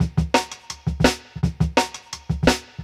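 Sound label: Jungle Breaks, 168 bpm; Original Jungle Breaks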